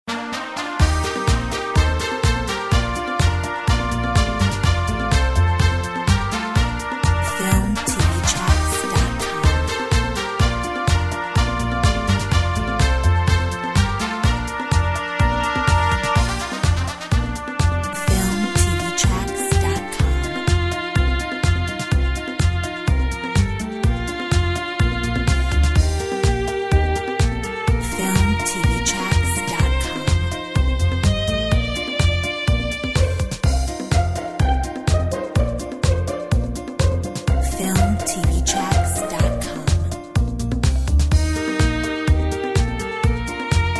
Mariachi

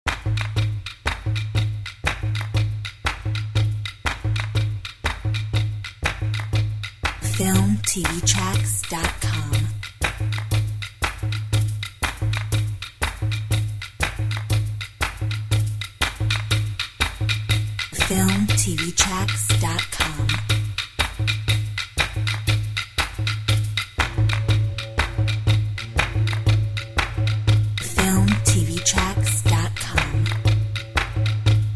Oz drums

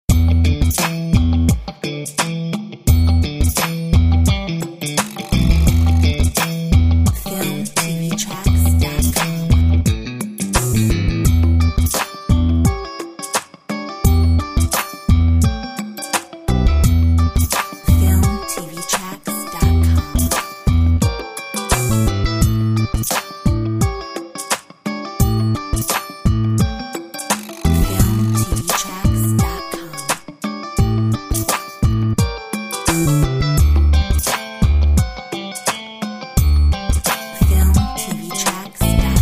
Nu funk

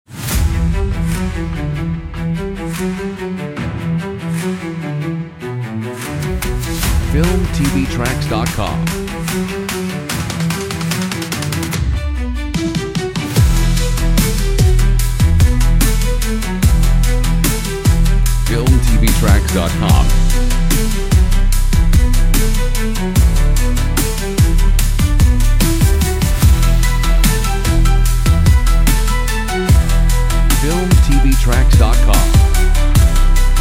SPORT EPIC TRAILER

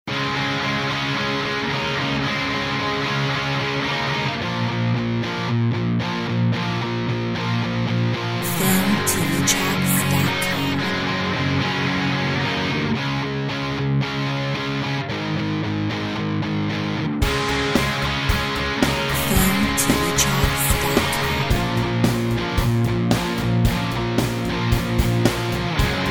Rock

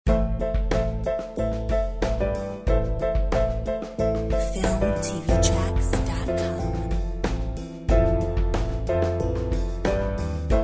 Piano Cello; Filmtv-tracks

